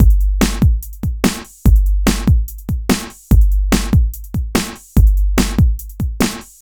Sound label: Kick Snare; Sonic Foundry (Sony Creative Software) - Electronic Point Blank